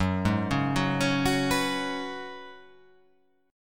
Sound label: F sharp Suspended 2nd Suspended 4th; chordshape; down